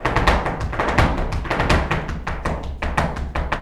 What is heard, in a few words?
Flamenco, ZAPATEADOSOL